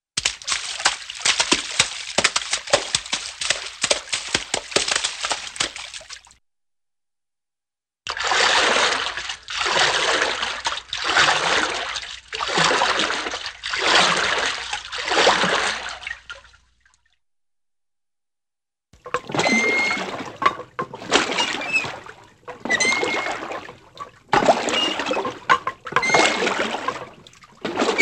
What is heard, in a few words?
Floppy Splashes Paddle Splashes, Cartoon; Hollywood Edge; Cartoon Trax